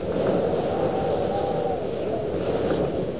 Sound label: peak wind